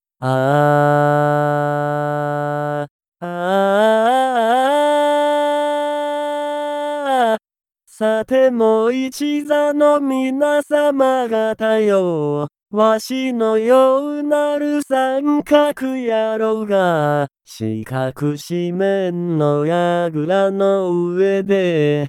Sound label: male